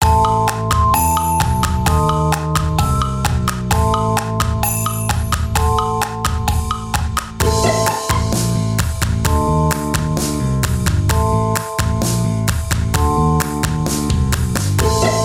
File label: Rap